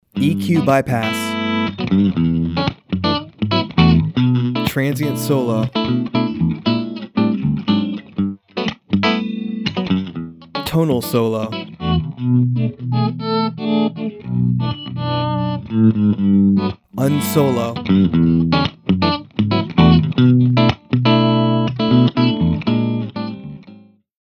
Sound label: Guitar, ギター – バイパス / トランジェント / トーナル; SplitEQ